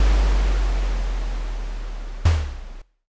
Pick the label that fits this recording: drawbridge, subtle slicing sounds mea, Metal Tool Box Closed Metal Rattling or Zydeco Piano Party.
drawbridge